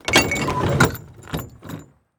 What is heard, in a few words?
Semi Trailer Door; Large Vehicles; Blastwave